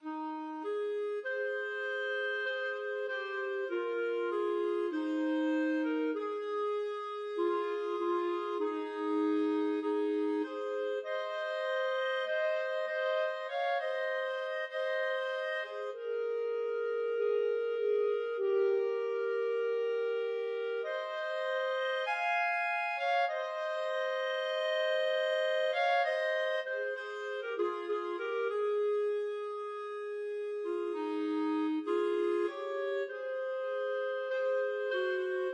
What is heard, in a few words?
clarinet duet